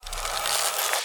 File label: iris mag end cast